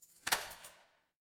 door lock

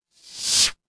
ui swipe

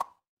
ui pop

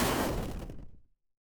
retro game explosion

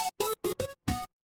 retro game game over

